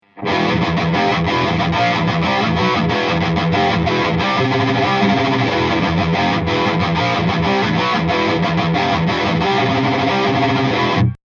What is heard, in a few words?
Lead; studio raw